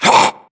grunt death neck; Titanfall 2

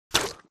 bullet light ballistic mud debris; Titanfall 2

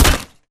bullet light ballistic spectre; Titanfall 2

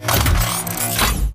Servo Jog; Titanfall 2

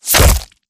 bullet generic head kill shot human; Titanfall 2

